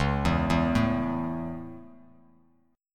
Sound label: Db Minor Sharp 5th, Bass Standard chord; arpeggiated; Dbm#5; JGuitar